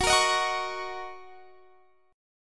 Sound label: Fsharp 6th down Guitar Standard; strummed; JGuitar